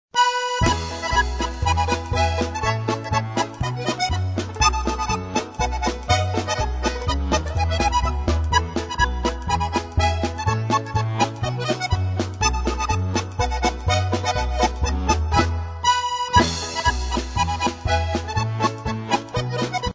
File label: polka, button box